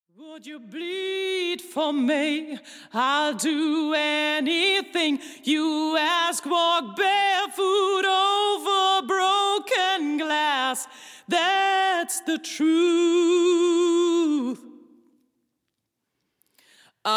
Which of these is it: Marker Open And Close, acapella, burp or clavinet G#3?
acapella